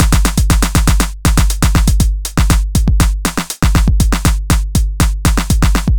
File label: groove pizza 120 bpm